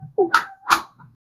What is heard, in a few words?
zombies